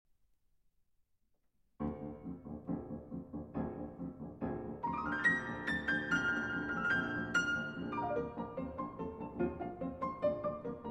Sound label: Nutcracker Suite, Piano 4-Hands; Tchaikovsky Nutcracker Suite, Op. 71a, TH. 35 (Arr. by Economou for Piano 4-Hands) - IIe. Danses